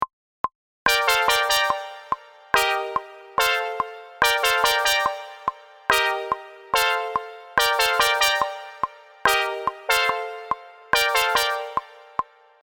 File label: Intro chord R